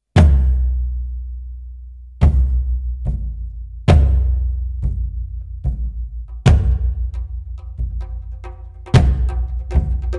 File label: old ukrainian cossack song